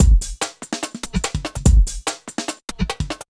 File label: kika 145